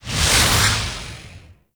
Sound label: Orbital Strike Ability Cast Throw; Warframe; Trapper